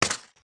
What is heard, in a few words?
breaking; breaking falling hit sfx